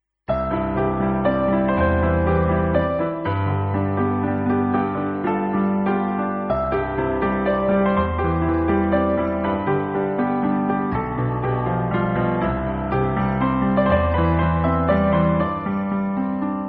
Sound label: plie